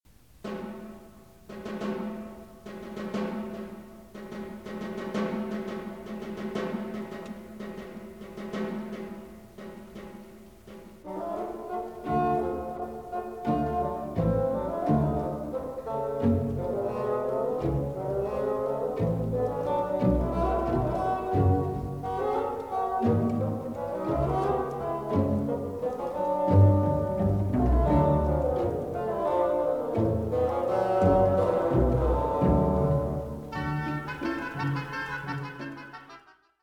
Bassoon orchestra excerpts; Dorati; London

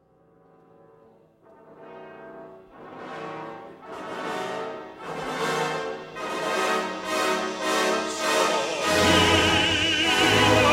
Orchestra Audition Excerpts Horn; Walkure Act 2 Horn 4; Bohm

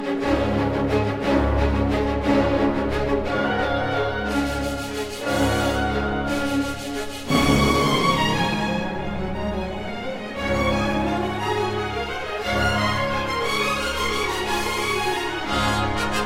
violin; Mahler 1.2; MTT; SFS; National youth Orchestra; Mahler: Symphony No. 1, Mvt. II, Rehearsal 8 until 5 measures before Reh. 11

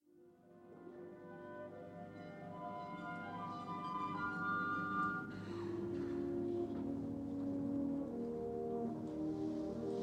Horn Opera Excerpts; Rheingold Scene 2; Krauss

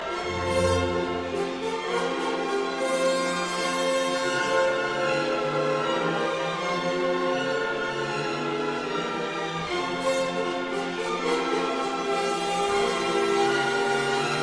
Horn Opera Excerpts; Siegfried Act 3; Bohm

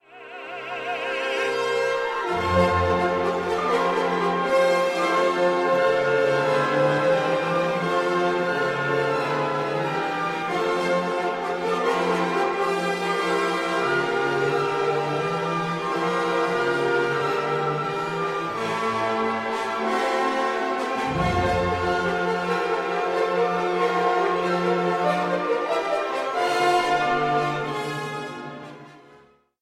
Horn Opera Excerpts; Siegfried Act 3; Janowski

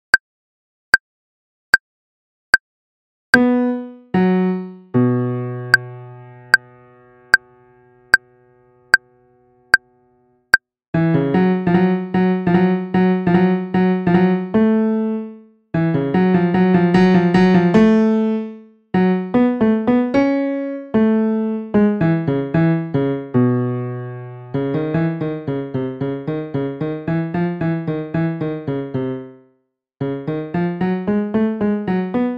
Concerto 2nd Violin Slow, orchestra excerpts; Bartok

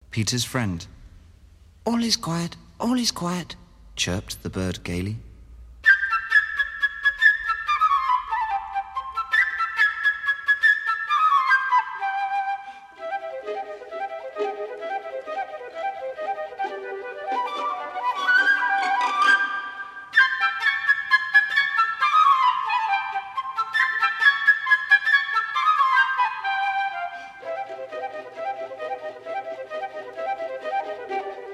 Flute excerpt; Prokofiev; Ormandy; Phillly

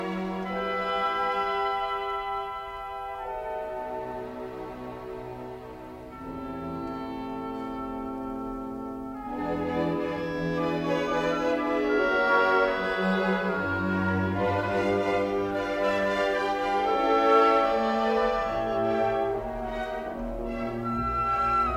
Flute excerpts; Brahms 4.4; NYP

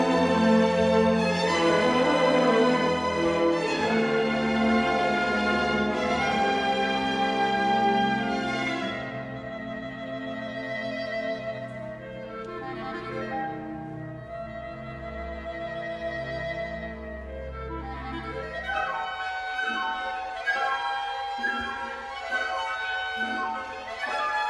Clarinet; Kodaly; Antal Dorati; Philharmonia Hungarica; kodaly dances galanta

